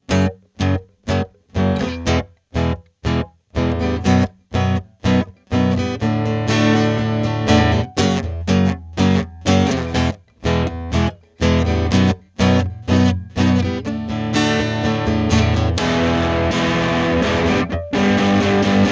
Retro20 clean OD

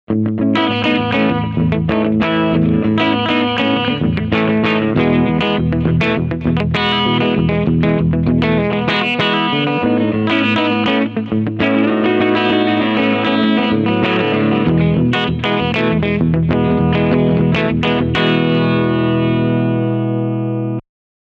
clean strumming beide picku

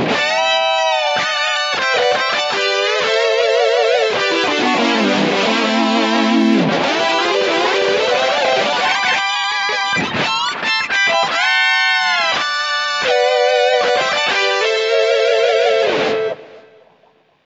Intelligent Harmony Mode 3rd Up 7th Up Minor

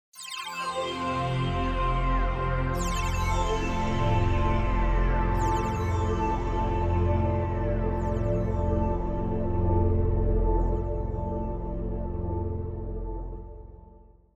Roland Fantom XR Synth

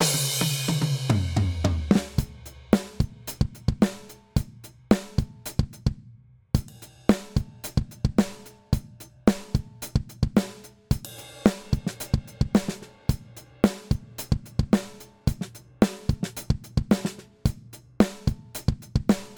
Drums strong compression; Antelope Discrete 4; Tubechild670

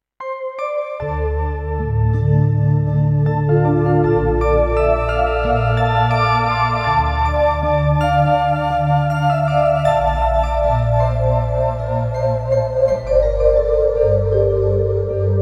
Layer Stick Guit Whistle Choir

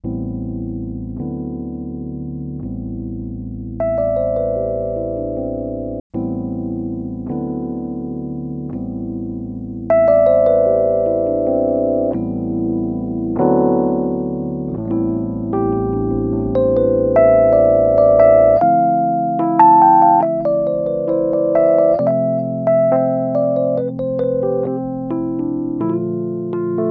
rhodes dry wet

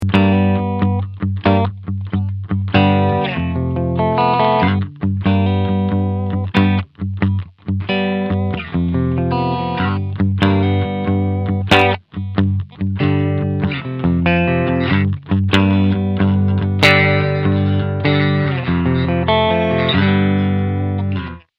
Warm Blues

